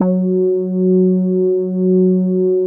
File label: BIT BASS, CRUMAR BIT01, Vintage Keyboards; Big Fish Audio - First Call Vintage Keyboards